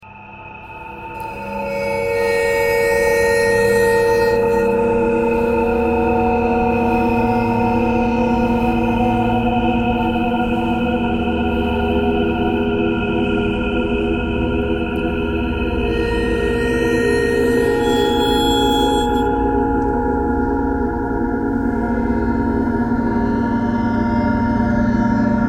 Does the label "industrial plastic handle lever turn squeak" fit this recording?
No